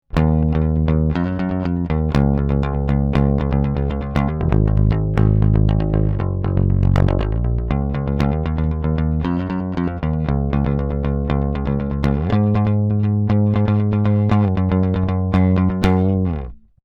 Rock unproc, fender

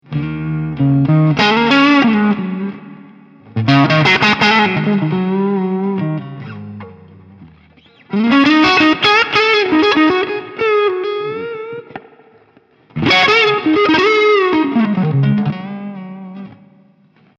Super Sonic Dynamic Solo; fender

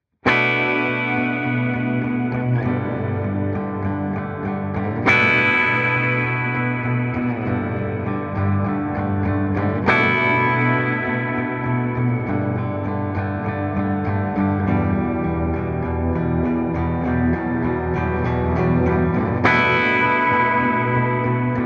X-SPACE SWELL; xgear

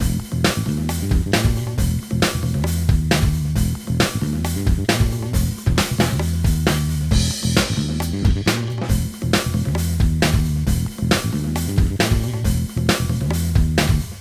Classic Comp tube and stereo width

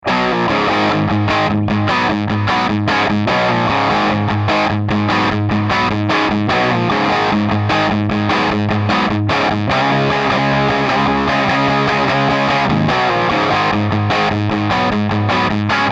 Rock, MultiFX; iRig UA

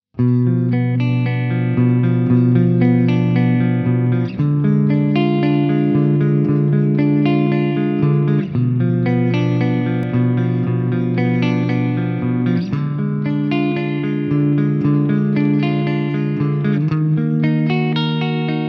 Not The Cleanest Mesa Triple Clean AMP; machine modeling

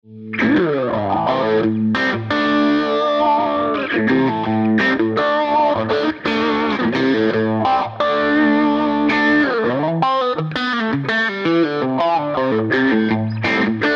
X-VIBE STONE; xgear

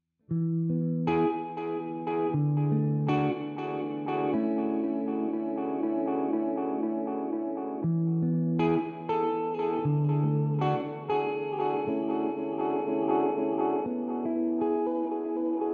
X-TIME DUCK; xgear

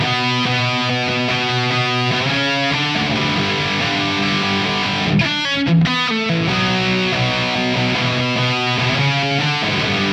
Midrange Sculpt Unprocessed

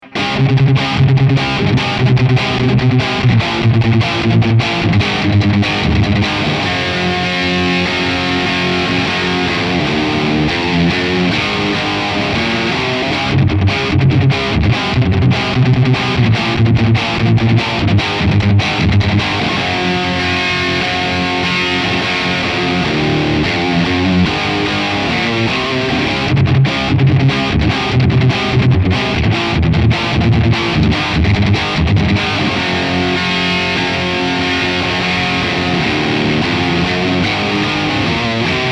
High Gain; iRig UA

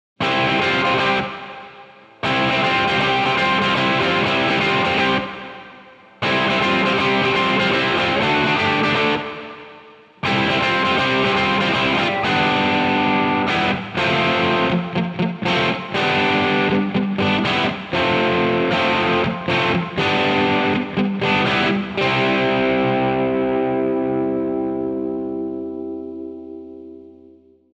X-SPACE HALL; xgear

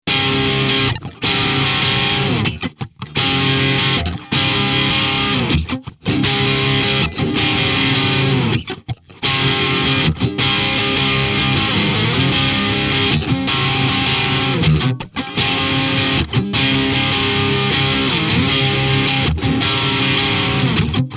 Crunch Chords, MultiFX; iRig UA

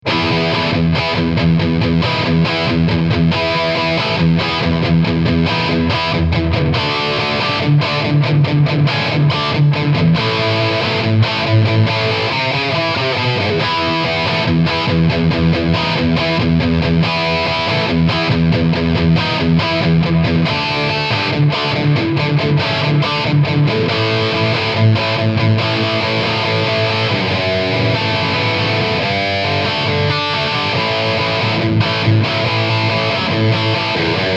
DRIVE METAL; xgear

